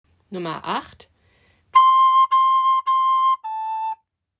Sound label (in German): Gitarre